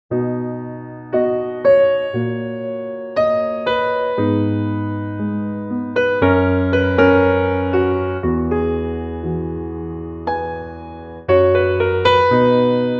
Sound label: Piano